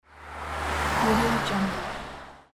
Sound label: car